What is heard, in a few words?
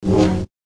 Saber; FXHome